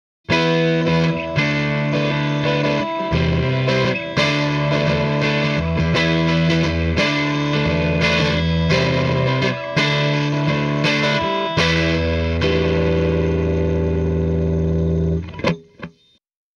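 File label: m75 lp neck pickup no dist deluxe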